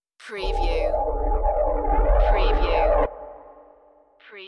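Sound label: Creepy Low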